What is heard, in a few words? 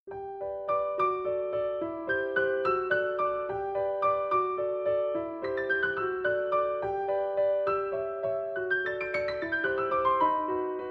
Waltz